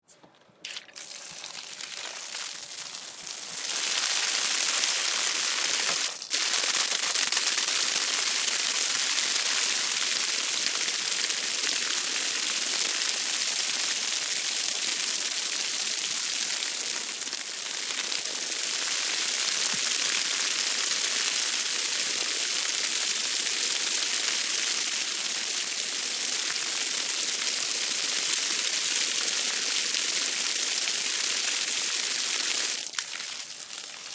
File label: Water Garden Hose Thumb Spray Grass On Run Off; Yard Tools